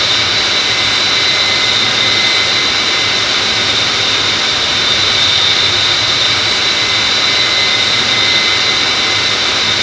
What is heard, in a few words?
Aircrafts Engines Engine TF34 GE100 In L; DCS